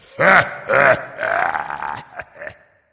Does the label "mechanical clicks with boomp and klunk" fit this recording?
No